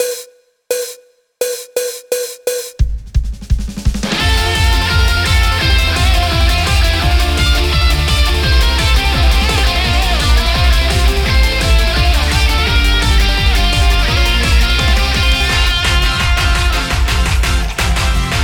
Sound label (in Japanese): bansou